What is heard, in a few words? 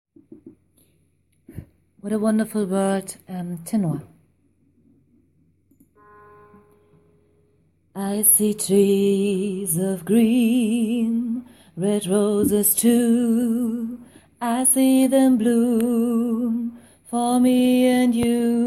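tenor, gospel choir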